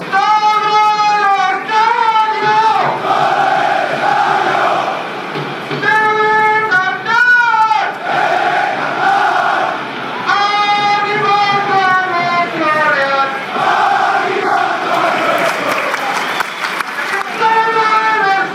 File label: all stadium fanchants